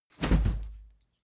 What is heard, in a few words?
whoosh cloth fast soft deep